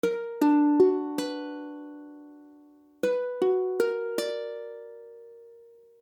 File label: Hmmaj7, akordy ukulele